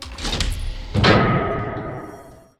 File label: FUTURE; Best Service; Advanced Mega Effects